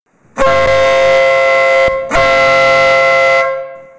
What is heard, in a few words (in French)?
son le compact, klaxon